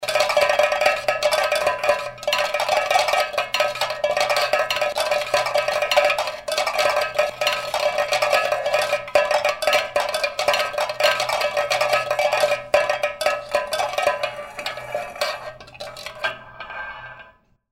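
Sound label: tin cans rattle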